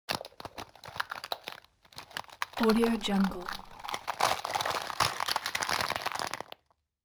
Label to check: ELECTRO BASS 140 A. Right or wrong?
Wrong